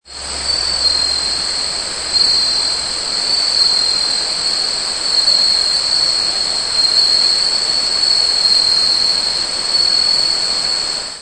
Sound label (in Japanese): suzumusi